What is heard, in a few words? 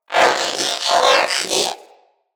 Companion Vocal Pant, Pets; Zanuka